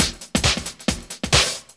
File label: HAT BEATS, Synth FX Misc